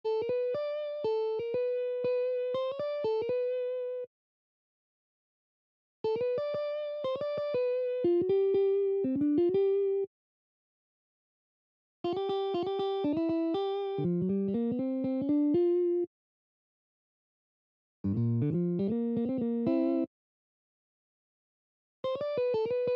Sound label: 12 Easy Slide Licks in Open G